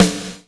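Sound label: snare, Drums & Perc; AKAI S6000 CD-ROM - Ultimate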